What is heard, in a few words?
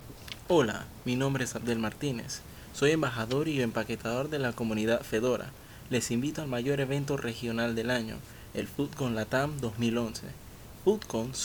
Jingle; fudcon panama 2011